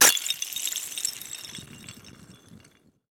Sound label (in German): Eisklirr